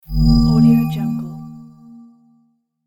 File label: holo accepted